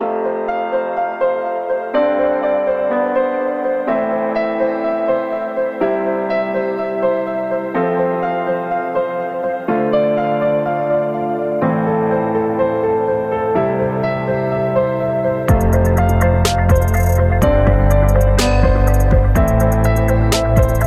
Cinematic Beat

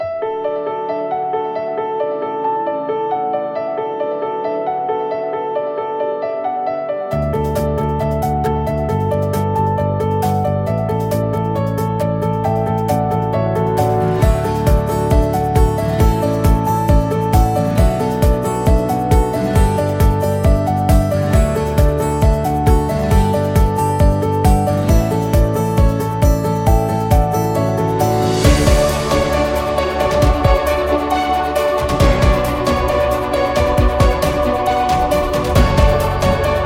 Cinematic Positive, corporate music; corporate music vol 11

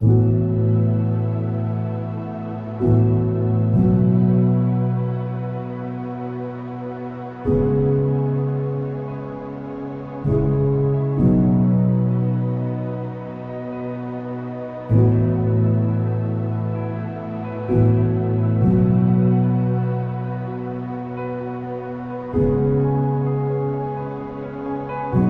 Cinematic Piano

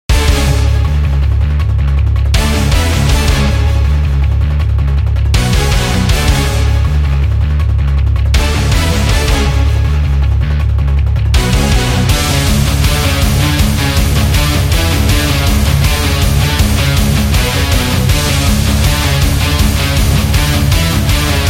Epic Heavy Metal

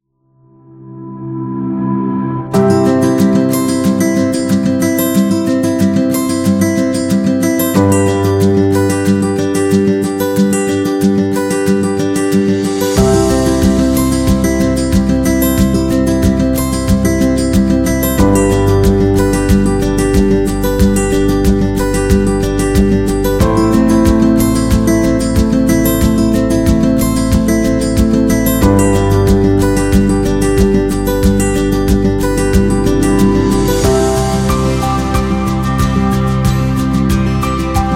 Background Guitar

